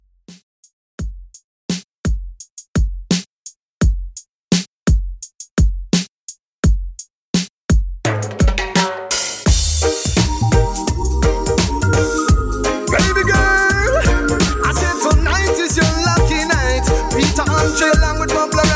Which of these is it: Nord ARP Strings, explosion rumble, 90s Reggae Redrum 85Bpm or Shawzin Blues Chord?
90s Reggae Redrum 85Bpm